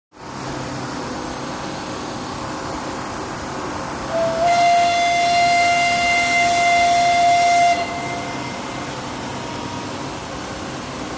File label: Blast Site Warn Whist